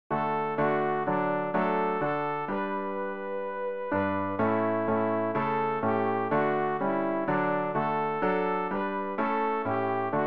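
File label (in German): sopran, Übehilfen für das Erlernen von Liedern; ref gesangbuch; rg 239 gelobet sei der herr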